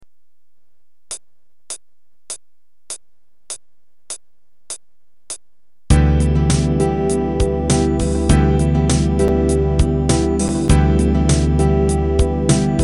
Em7 Relaxed 100 bpm

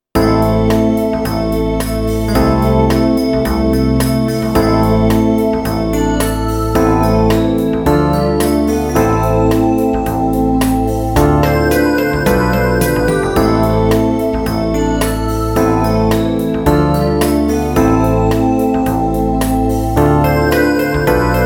synth crystal 109

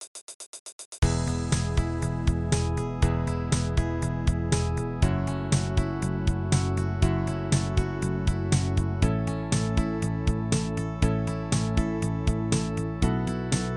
Straight Rock Flow Rock 120

